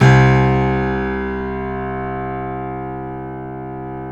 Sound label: KEY, Steinway ff